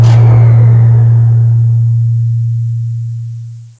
tesla off

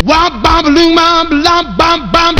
TUTTI

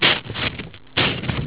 cut, paper; EFFECTS

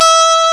TENOR SAX

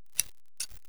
SCISSORS

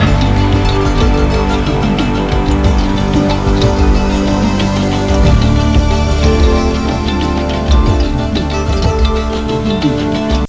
NEWAGE, MUSICAS

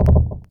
ECHO THUD; EFFEKTE; MAGIX

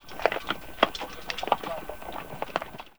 FOOT; TOAW

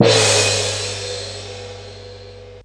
GONG CYMB

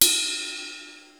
RIDE; BEAT2000

